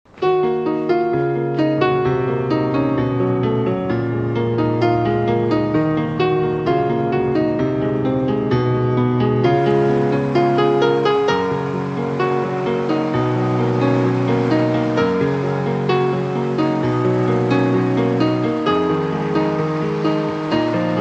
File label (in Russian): Spokojnaya muzyka krasivaya melodiya